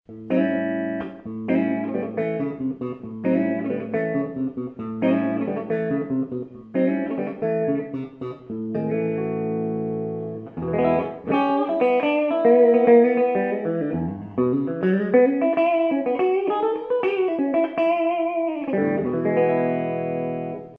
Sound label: black middle, guitars; Standard P-90 Middle